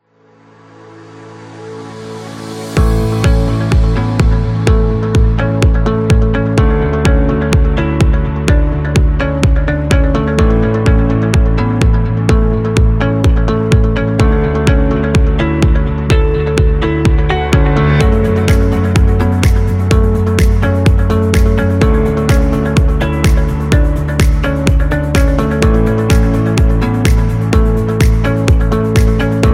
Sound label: This Is Corporate; corporation